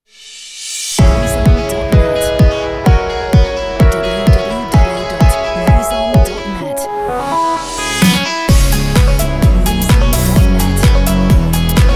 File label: Electronic DANCE